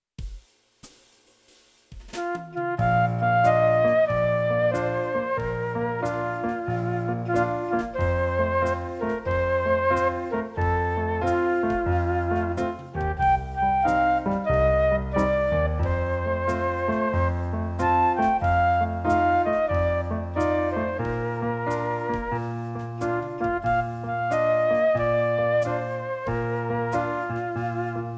Band; christmas

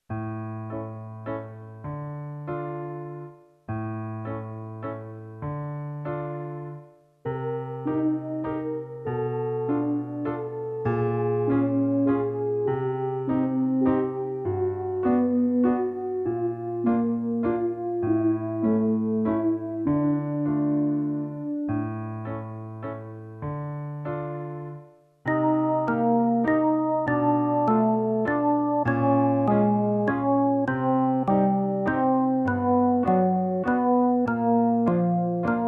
Level A Warm up in Eb, brass

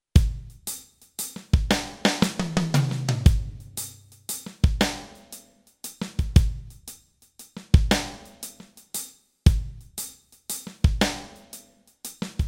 Improvisation

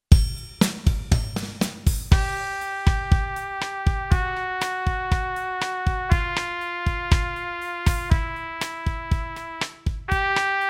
Warm up in Eb, brass

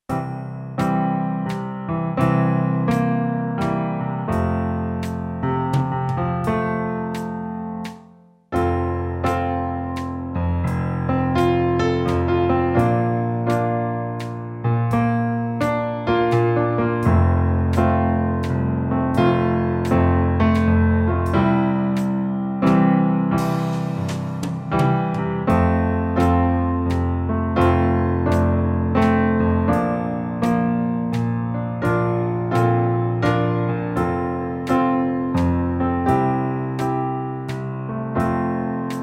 Warm up Impro in F, brass; Level B

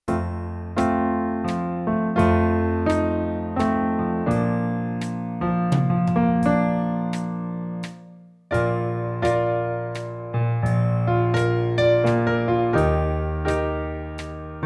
Warm up Impro in Bb, brass; Level B

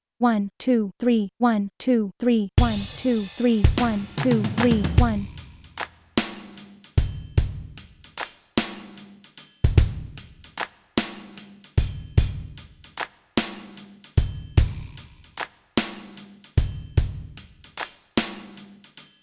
Improvisation, brass

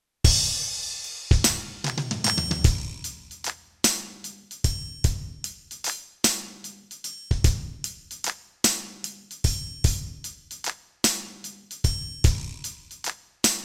Warm Up; SB Level A; No 4

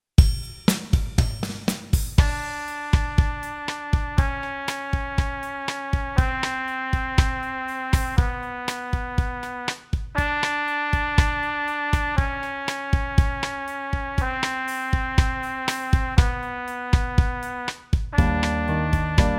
Warm up in Bb

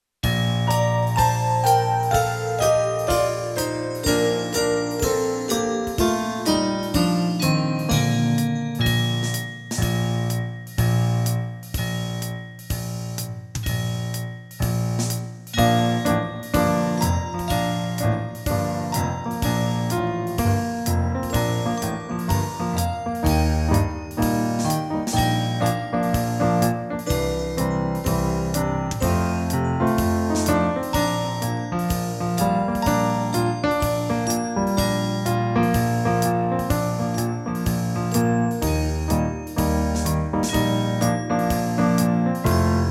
Jingle Bells Brass in Bb; christmas

